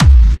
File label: Clubby Kicks, Bassdrums